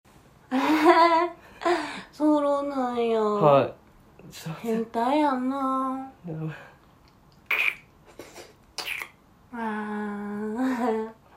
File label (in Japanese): フェラの音